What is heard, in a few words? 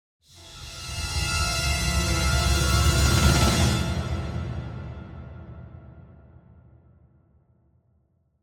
Symphonic Hit Delay The Danger - Short Pad; Hollywood Edge; sound designer's toolkit v2